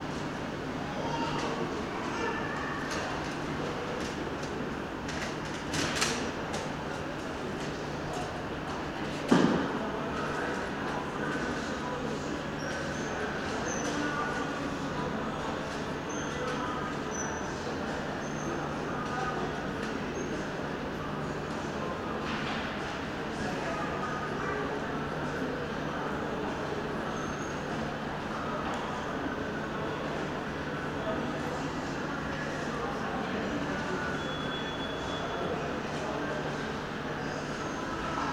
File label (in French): centre commercial